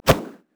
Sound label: cryophoenix basicattack; LoL; anivia